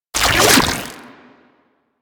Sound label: Rifle Charged Grapple; Warframe; Infested; Quanta